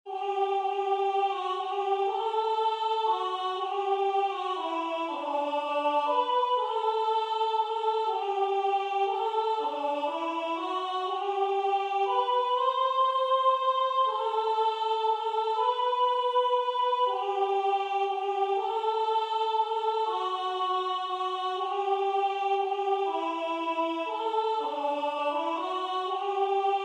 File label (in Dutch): O kindeke klein Vrouwenstemmen